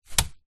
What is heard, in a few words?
leisure juggling bean bag small land on wooden floor; zapsplat